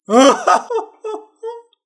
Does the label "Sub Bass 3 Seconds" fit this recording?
No